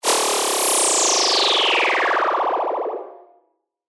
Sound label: FX; bass tech house; Ryde or Dye; revealed serum 2 bass tech house vol 1